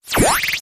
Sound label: Sharp Cut Sweep